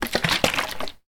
household water spray bottle shake water inside; sound effects one

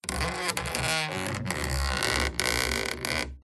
Stress Creak Squeak Groan; PMSFX

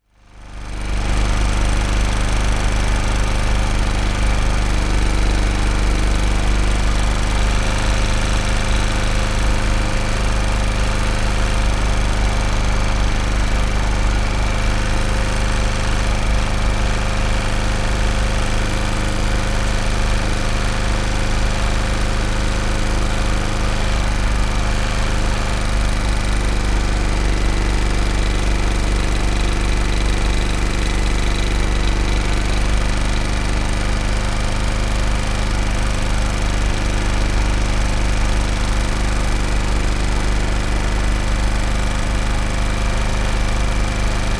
Generator Idle; noise creations

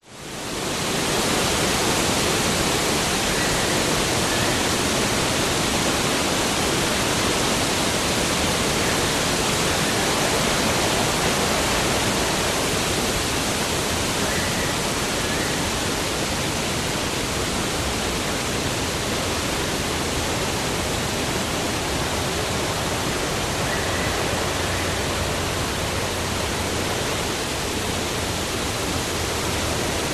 nature wind trees forest constant few birds short

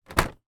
office printer paper access door drop open; hp photosmart c3180

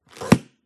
book close paper; PMSFX

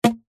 card long hollow tube grab or catch hand; foley

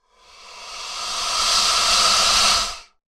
animals alligator hiss

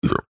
human male burp short gassy

